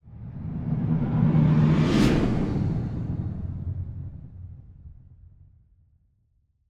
whoosh sound design airy hollow thick waver medium; sonniss gdc